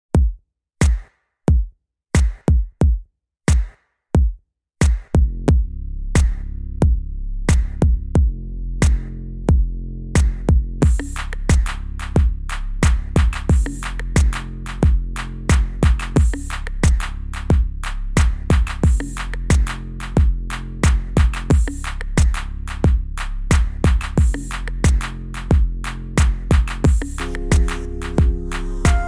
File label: Dubstep Mix